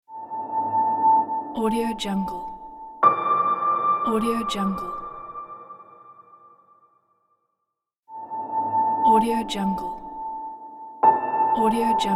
reverse piano one note cinematic logo; m3m

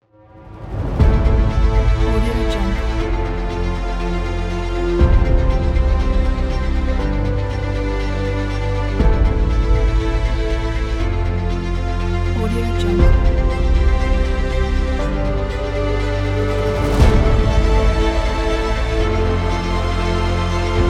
fantasy epic trailer

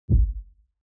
abstract hit sub bass; smartsoundfx

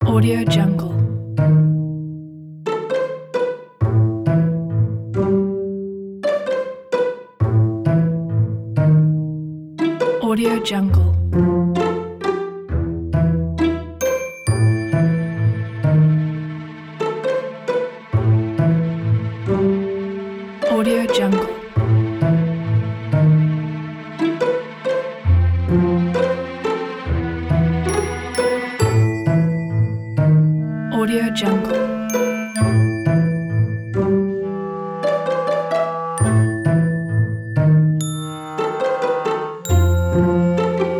stupid comedy spy

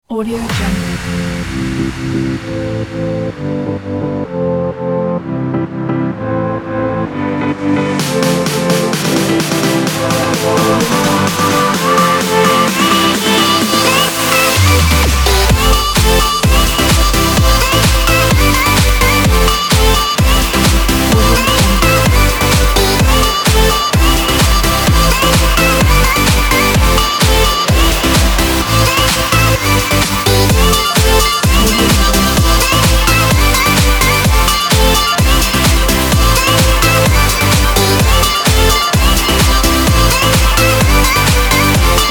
edm kit

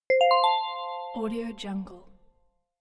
correct answer

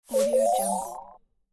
cosmic whoosh

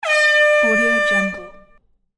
air horn; audio-therapy

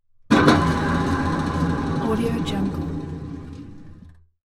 drill press

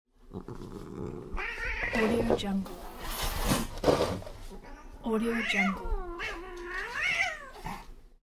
fight cats with growls sizzle and meows; jdproduction

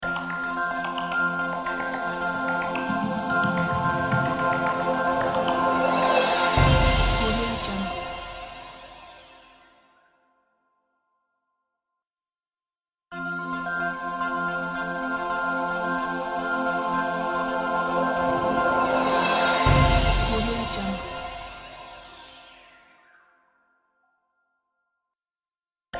big universe logo; drtimwaters

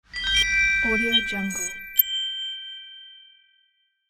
clean logo

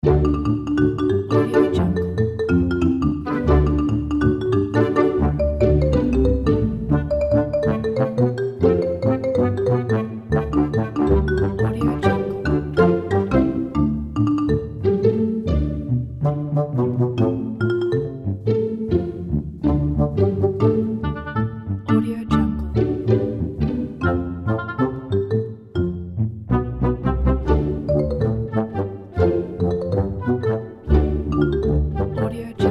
casual game

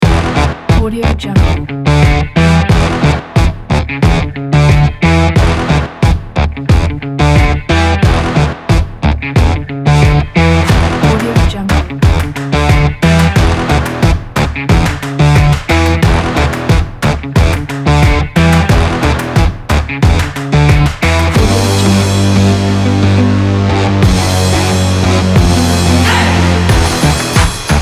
upbeat energetic funky groove

